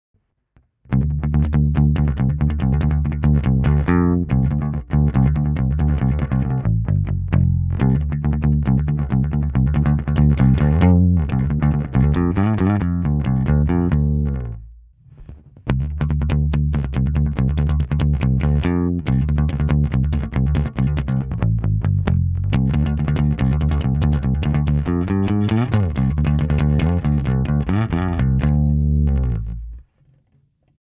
Ampeg Opto comp Pick

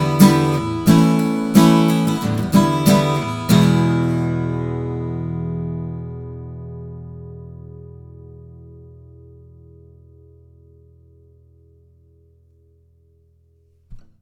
ACC 30cm

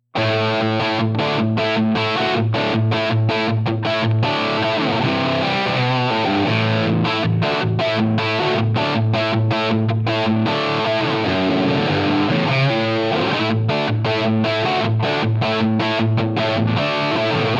Line 6 Pod Go Wireless Amp High Gain; Ventoux

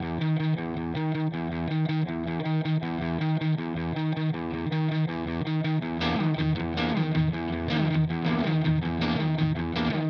Germanium Fuzz, Vintage Pickups, Low Gain; Benson

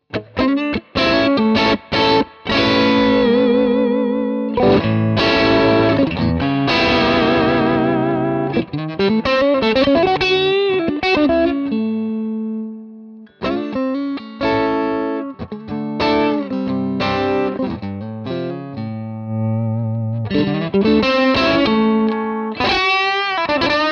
Pushed Clean Strat

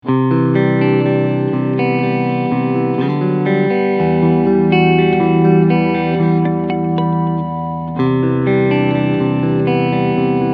Clean Pick SC Split

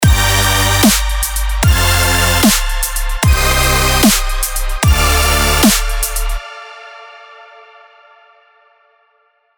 Dubstep Electro Melodic Drop; Nexus 3

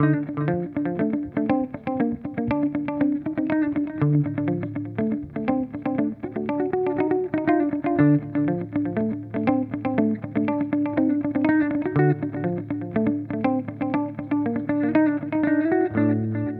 HX Stomp Delay Ducked; Vergleichstest

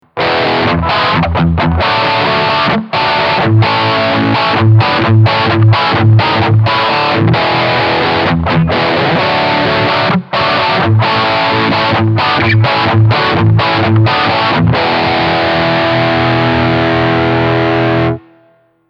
Fender Strat; test fender ltd am sp 70 hardtail strat bk4

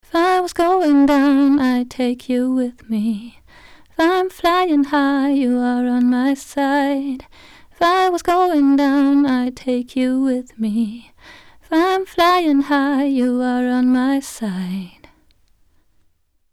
Black Lion Audio B173 Vocal 80 dB Gain

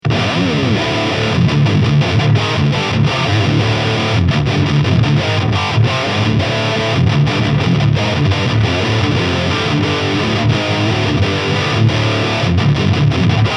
Chapman Guitars ML2 Pro High Gain; Azure Blue

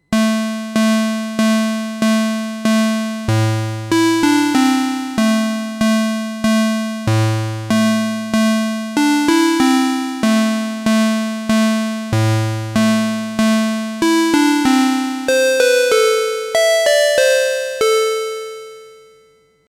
JU 06A Puls

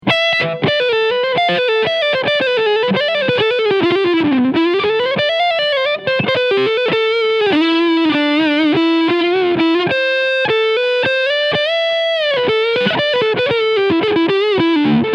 TE 53KR BL Tribute Serie Front PU Overdrive; Harley Benton

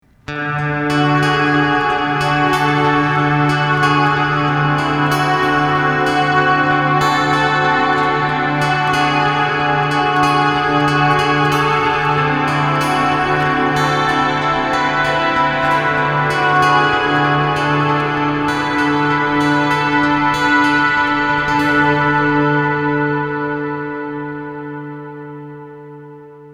mooer shimverb pro digital reverb

